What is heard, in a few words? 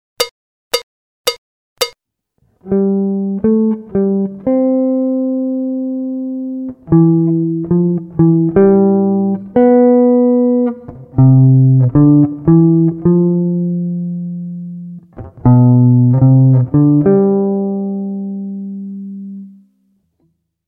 swing; Jazz Harmonia